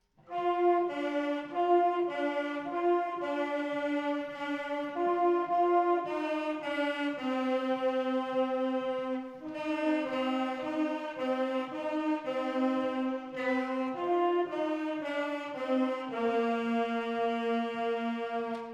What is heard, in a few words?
Saxophones